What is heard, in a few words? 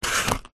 household match box close